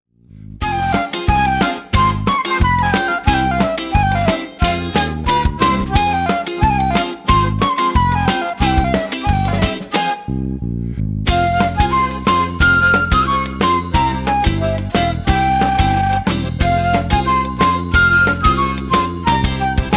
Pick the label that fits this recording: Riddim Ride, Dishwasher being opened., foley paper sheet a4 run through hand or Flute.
Flute